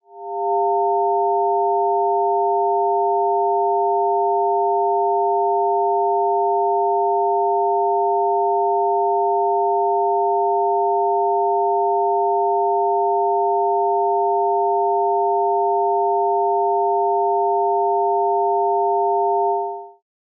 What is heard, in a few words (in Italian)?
fine allarme alluvione